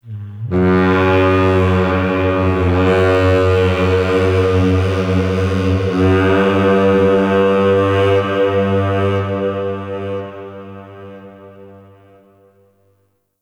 Castle Distant Dark Horn; Ambience; Midrath Island

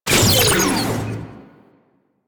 Power Down; Warframe; Corpus Gas City; Spy

